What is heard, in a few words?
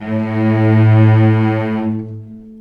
VCS ATK A2 L, VCS ARCO, String Collection